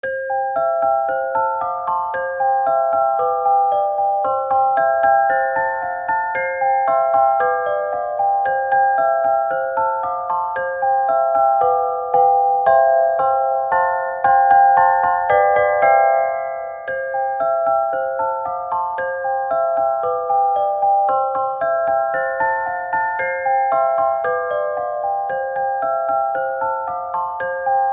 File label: donguri korokoro chime